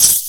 CHIPS, Anime Sound FX, SCIFI

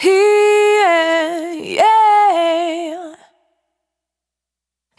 Single Voice Melodic Loops

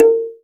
SANSA A#3, KALIMBAS; Spectrasonics; Heart of Africa

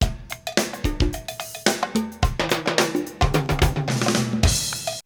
Drumloop; ZERO G - Datafile DISC 3

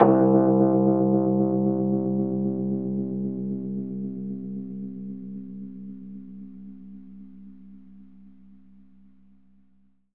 Rhodes fast C, 3D Keys; 3D Sound; 3D Keys VOL-1

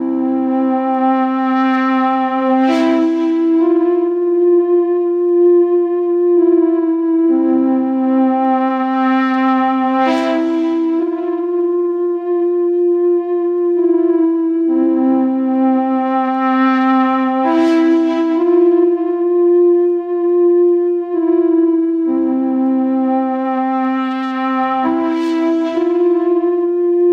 NRG Pad

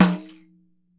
pandeiro, steel percussion; E-MU Producer Series Vol. 7 – Old World Instruments

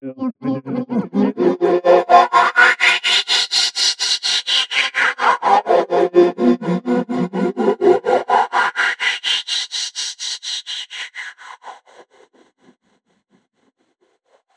MONGOL V FX, Asian Traditions; USB Soundscan vol.60; AKAI

